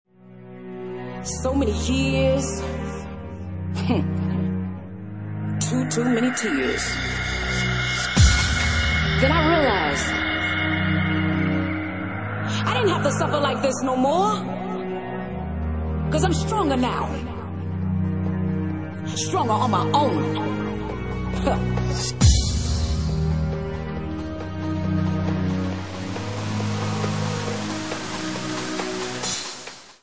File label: Bassline; Bassline Meltdown Session 1